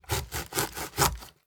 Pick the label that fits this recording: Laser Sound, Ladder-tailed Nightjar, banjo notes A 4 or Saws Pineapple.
Saws Pineapple